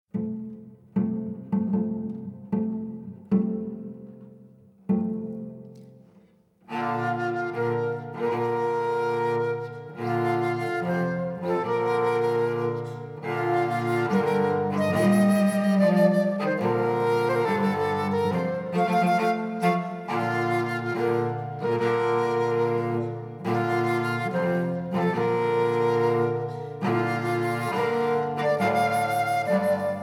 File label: Bridal Chorus; Lohengrin